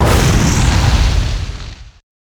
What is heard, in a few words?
Fireblast; Dota 2